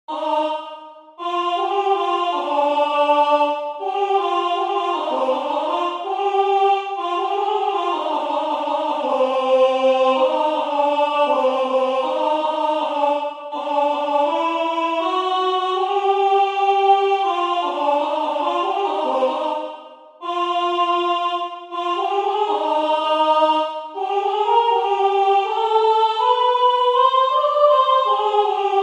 entonacion piano voz; Entoación con acompañamento

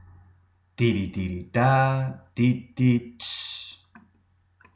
RITMO

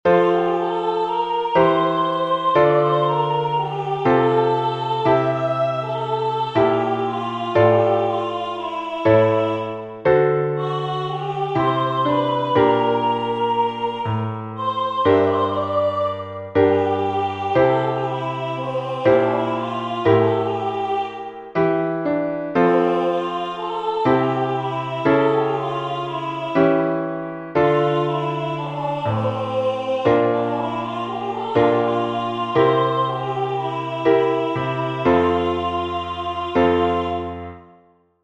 entonacion piano; Entoación con acompañamento; Exercicio 3; Melodía e acompañamento

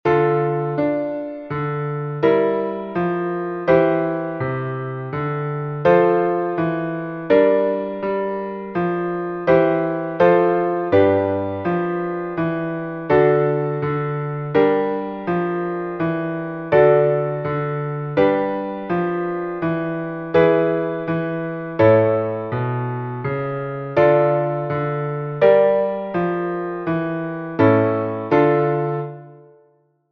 entonacion Piano; Entoación con acompañamento; Só acompañamento

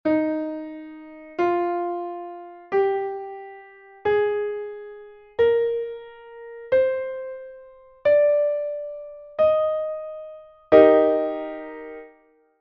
escala mib mayor

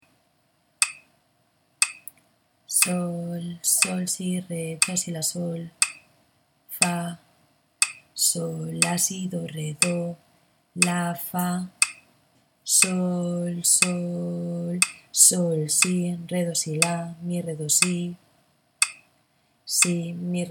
ritmo 6-4

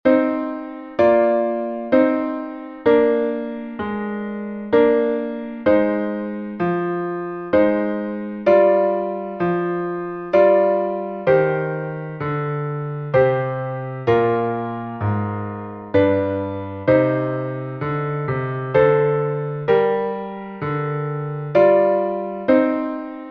entonacion con piano, Piano

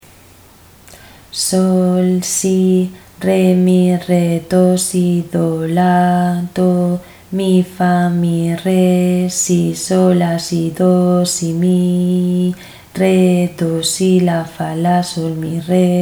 ritmo 6-4

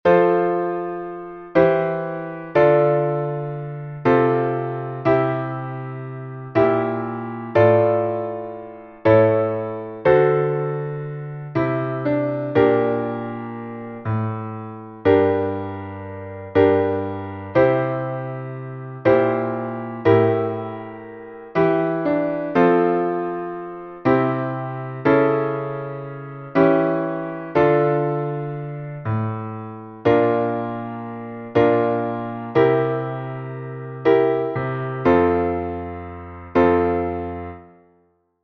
entonacion Piano; Entoación con acompañamento

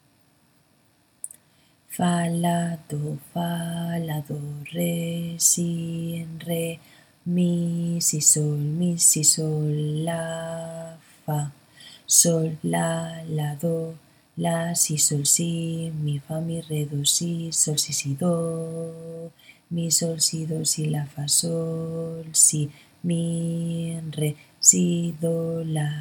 ritmo 6-4

